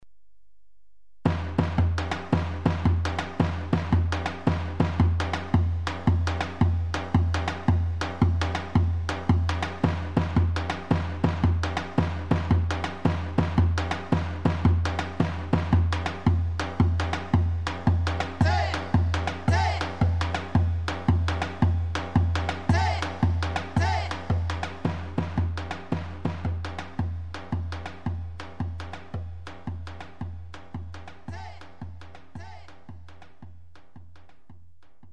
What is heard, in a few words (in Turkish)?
erzurum 6 8; sx3000; ritim demo